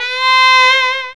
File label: FUNKY SAX; Zero-G; Funky Elements